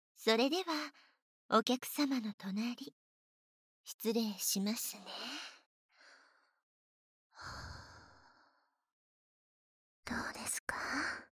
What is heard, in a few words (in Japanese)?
耳元で囁き耳舐め＆乳首舐め